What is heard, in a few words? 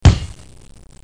Splat; JONAH